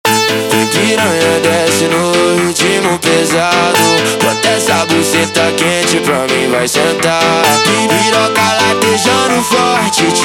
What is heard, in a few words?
phonk music